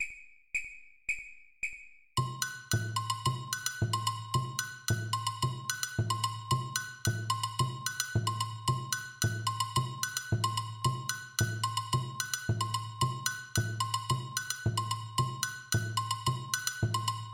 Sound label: agogo new samba